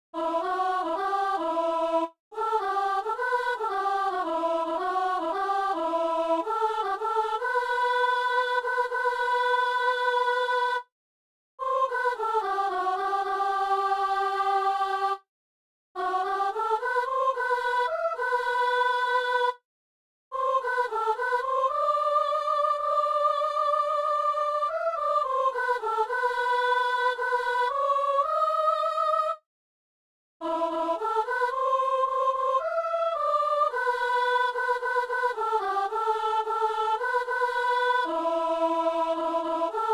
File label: soprano; Messe du 17 janvier à Saint-Denis; Notre chemin